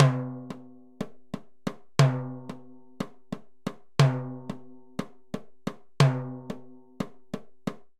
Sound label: Fusion 6 8 Timbales 120bpm; Latin Percussion; Ableton; Factory Packs